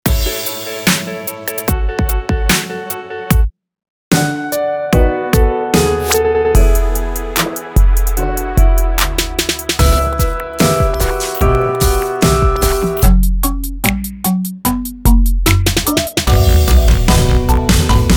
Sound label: TURNTABLE ATTACK ON A G-STRING